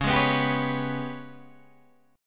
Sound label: G sharp Minor Major 7th Double Flat 5th, chord; 9,11,9,12,12,9